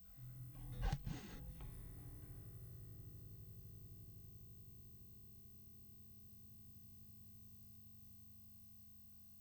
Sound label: EBass DI